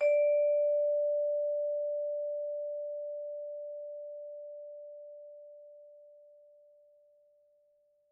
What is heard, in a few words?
Vibes hard D4, Vibraphone, Hard Mallets; Struck Idiophones